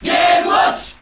Grito del público JAP; Mr. Game & Watch; SSBM

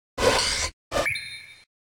Grito de Gallade

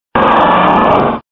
Grito de Swampert

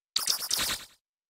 Grito de Milcery

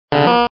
Grito de Slowpoke

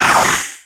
Grito; Zangoose

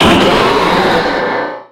Grito de Mega-Sharpedo

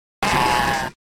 Grito de Cranidos

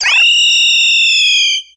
Grito de Staraptor

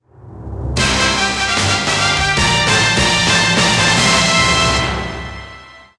Tema de victoria; Punch-Out; SSB4

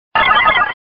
Grito

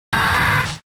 Grito de Grotle

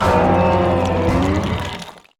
Grito de Ting-Lu

